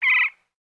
Ambient Sound; pangya fantasy golf pc

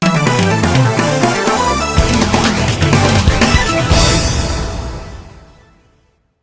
Special Stage Clear Jingle

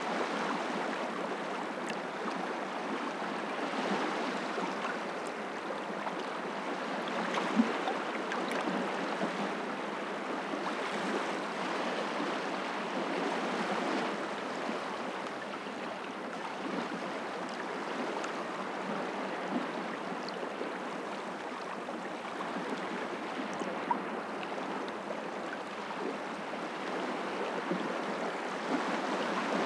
amb ocean calm

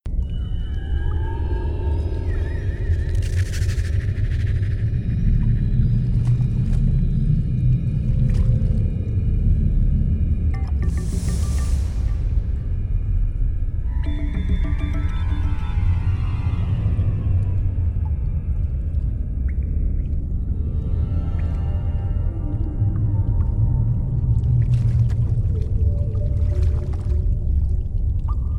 Ambient The Sewers; rayman 2 remastered soundtrack

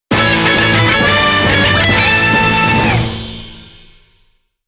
Dungeon Goal

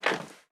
Sitdown

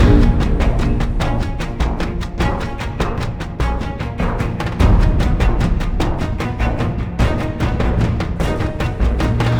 Combat Loop; Battlefield 1

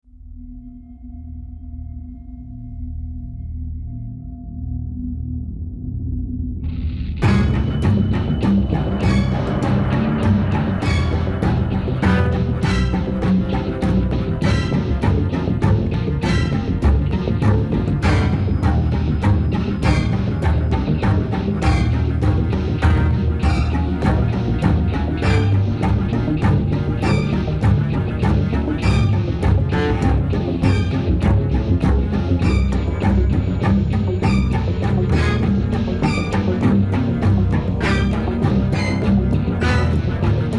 Action (Loop); The Walking Dead: The Telltale Series

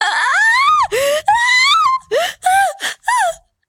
Scream

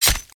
ultimate attack impact; minsc

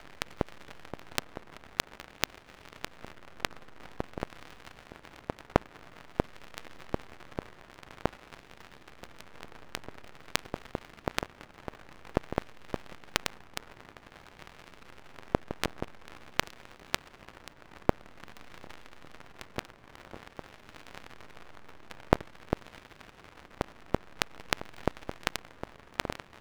Midgame Atmos; imscared

